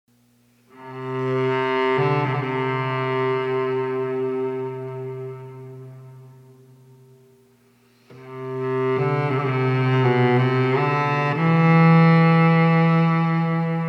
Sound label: Mystical Strings